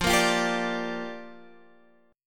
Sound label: G Minor 7th, F